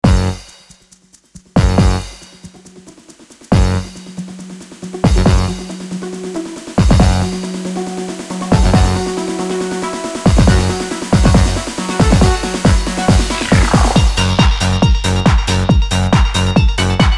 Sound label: rhythm